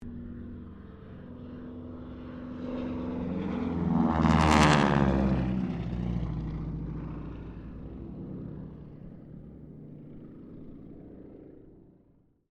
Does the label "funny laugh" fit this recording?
No